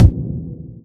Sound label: Kick